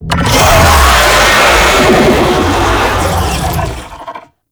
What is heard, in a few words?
Infested Vocal Attack; Warframe